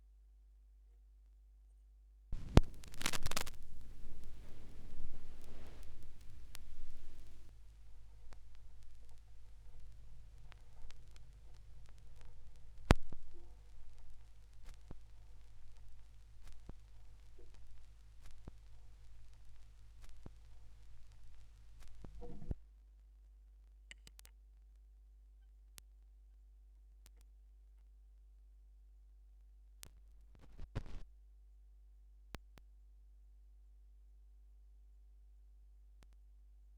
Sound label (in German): Schallplattengeraeusche; rauschpartikel; Vinylknistern